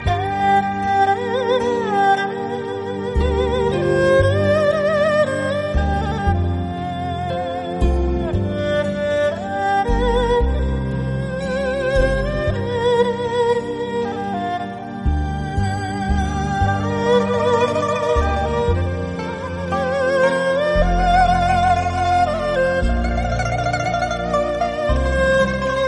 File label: tai chi music, ringtone